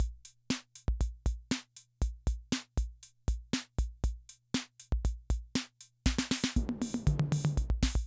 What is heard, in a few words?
Loop, rhythm 55, classic machines